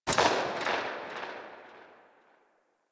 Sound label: Pistol Tail Ext A; Weapons; Warframe; Tenno; Guandao